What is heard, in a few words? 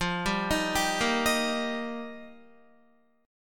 F 7th Suspended 2nd Suspended 4th, Guitar; F7sus2sus4; arpeggiated